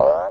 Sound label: frog jump; N64; YOSHISISLAND